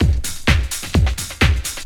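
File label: MERCURY LOOP, Techno Trance; E-MU Formula 4000 Series Vol. 2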